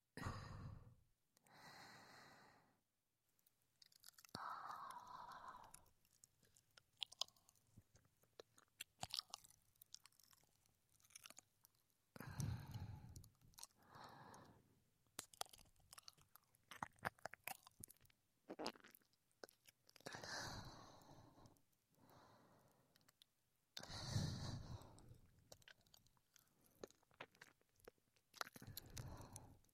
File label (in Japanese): 耳舐めのみ